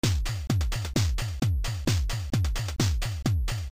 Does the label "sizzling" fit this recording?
No